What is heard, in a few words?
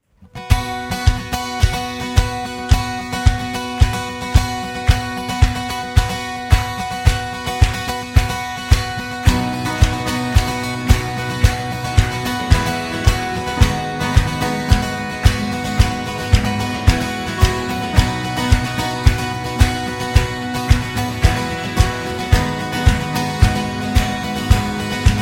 acoustic version